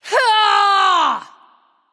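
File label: battlecry, female; smite; voicepack; hera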